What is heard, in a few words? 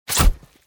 slice